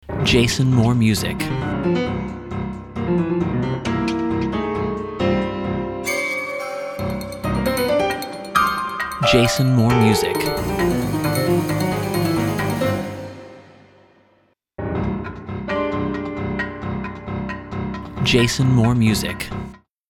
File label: Piano Chase